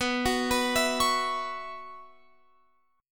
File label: B Suspended 2nd Suspended 4th, Guitar, Open F; Bsus2sus4; arpeggiated